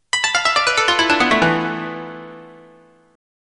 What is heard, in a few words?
koto